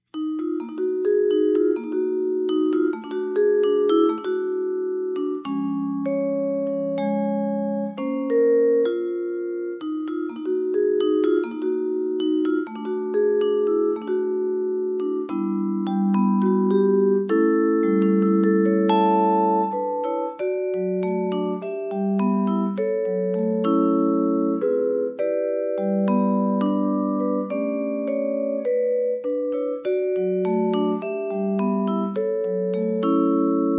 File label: Vibraphone